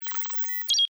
Scifi, Computer, Beep, Readout, Device, Science, Fiction